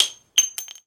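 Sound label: shell bounce large, weapons, shells; ModernWarfare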